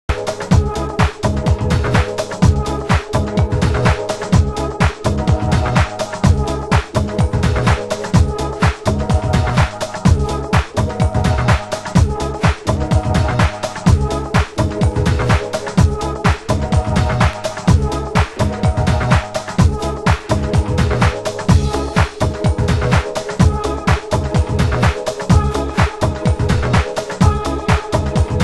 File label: jazz, disco dub, nu house